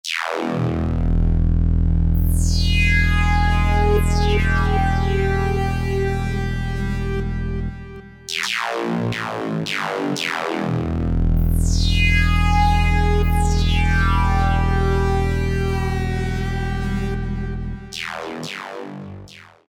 Ring Split, Roland JP-8000; Factory Performances

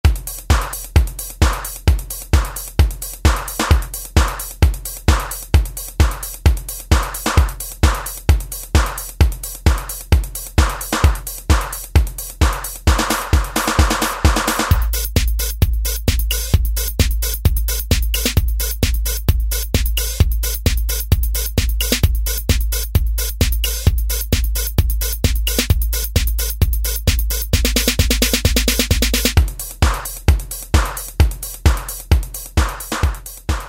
Robot, Alesis SR-16

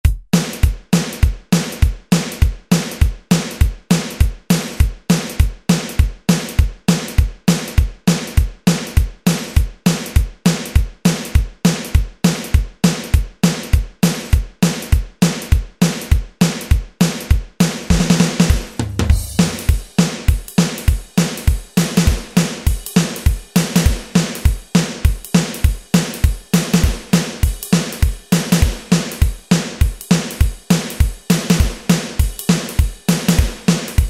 Rockabilly, Alesis SR-16 Preset Patterns

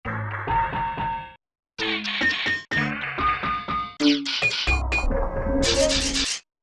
Broken, Sound Effects; Korg MSC-14S Sound Effects

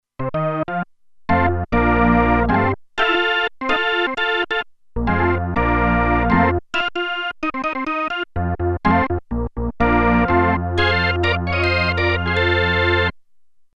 Organ, Roland Alpha Juno-2; Original Sound Chart patches

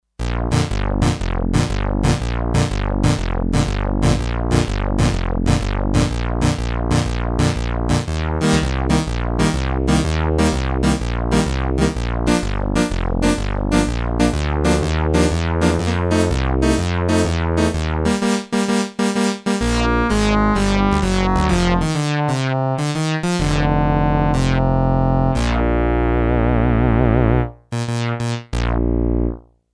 Twang, Sequential Circuits Six-Trak; Factory Programs

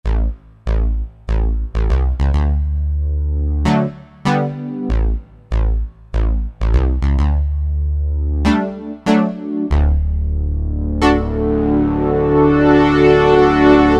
BR Plucky, Yamaha TG77; Factory Presets

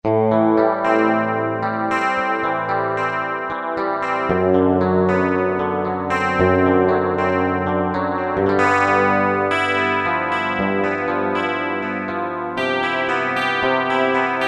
Chorus Gtr, Korg T3

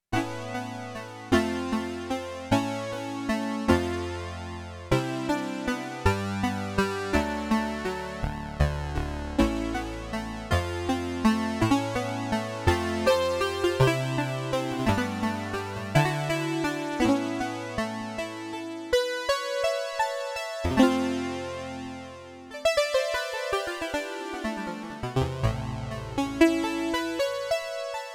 TWIN PIANOS, SIEL DK 80; Factory

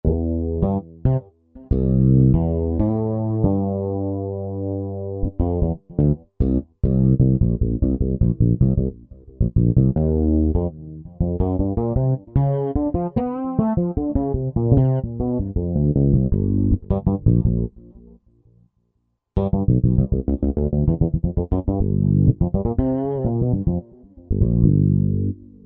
MM Weathery; Roland SR-JV80-10 Bass & Drums

